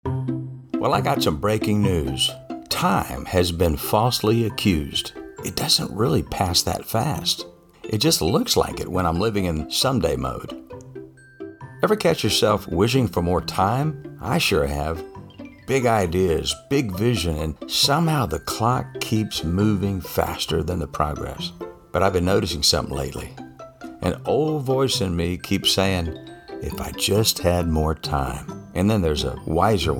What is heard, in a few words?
delay